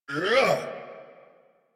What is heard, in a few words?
Exert; en; Warframe; New War; Kahl